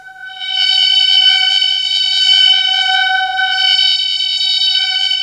VOCO CHOR G4; Best Service Dream Experience

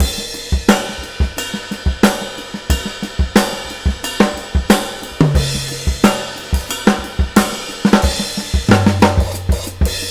JAZZY 90 BELL GROOVE, DRUMS LOOPS; BASEMENT DRUMS VOL 2

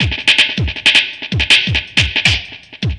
Beat, Futurist Drum & Bass; Drums & Percussion

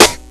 Snare Buzz; Mo' Phatt; Digital Sound Factory Mo' Phatt

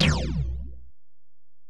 Tom, Alesis DM-PRO, Drum Machines, Toms

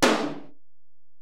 Tom, Alesis DM-PRO

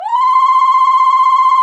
Zaghrouta Loop

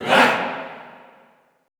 ALL WAE, Classical Choir, SHOUTS; Best Service; Extended Classical Choir

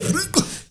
M FX FILL, MALENOISE; Spectrasonics; Heart of Africa 1

